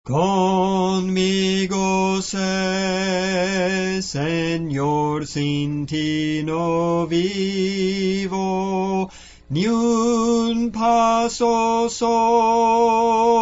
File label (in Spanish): Soprano, Cantos Espirituales; Soprano (melodía)